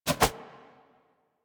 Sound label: Hand Shotgun Reload Flip, Weapons